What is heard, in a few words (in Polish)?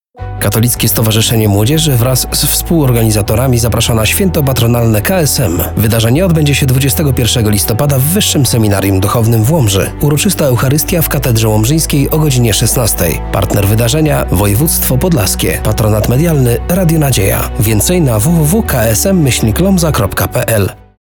jingel; ksm uroczystosci 2021